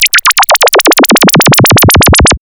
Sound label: SYN-FX